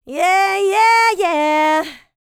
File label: LICKS, Vocal Planet, R&B; ILIO; Dance; LT R+B